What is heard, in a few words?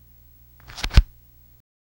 flip card